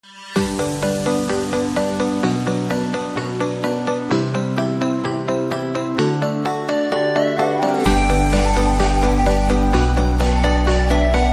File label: starting musik tone